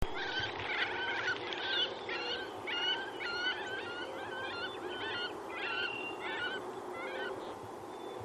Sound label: wood duck